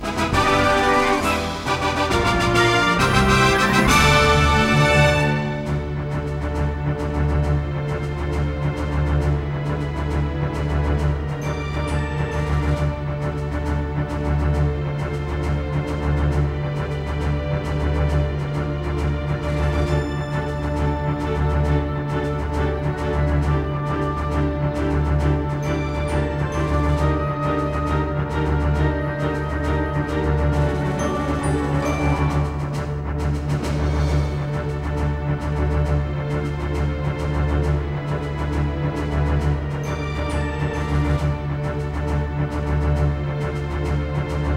Level Complete; Star Wars Rogue Leader Rogue Squadron II

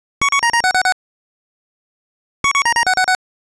Bonus Item Appearance; Oretachi Gesen Zoku; BurgerTime Music CD

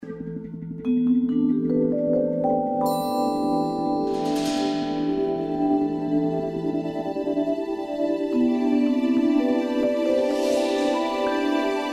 Mystic Jungle; club penguin

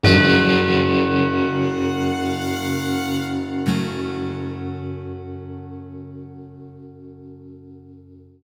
Stinger Jail Empty

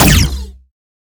Shot Small Laser Supercharge Phaser

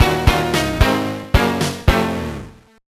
Minigame Lose; gba gamerip 2007; Drake & Josh

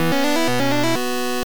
Level Start; virtual lab virtual boy

